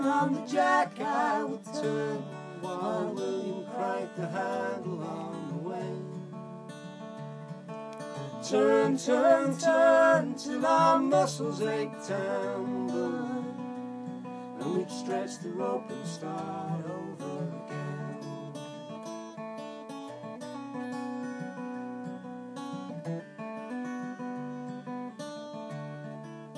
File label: live folk music in the studio